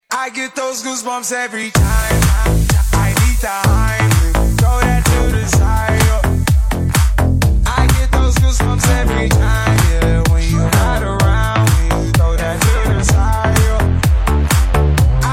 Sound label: chemical disco remix